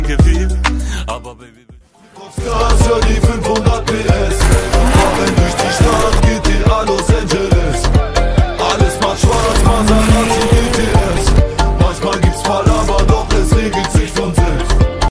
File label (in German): deutsche rington